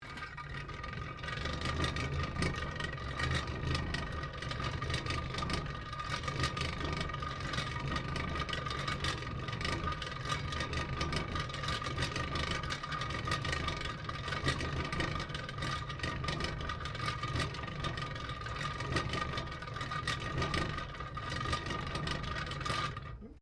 Butter Churn Domestic Handpowered Jar Top Rotary Operating; Kitchen